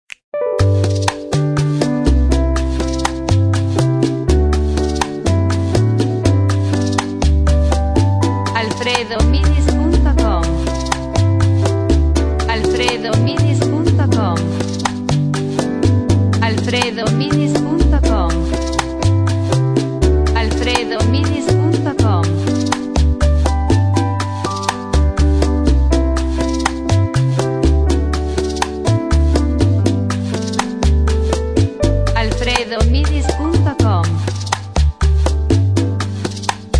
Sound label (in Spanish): sin coros sin flauta